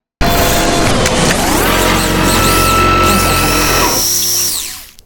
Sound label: power move mech, Robotic Lifeforms; SoundMorph